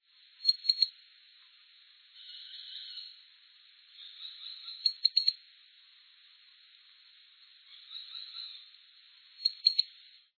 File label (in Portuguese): poadeiro-de-sobrancelha